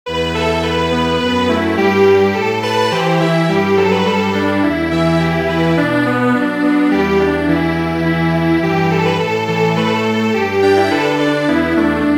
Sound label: Strings Quartet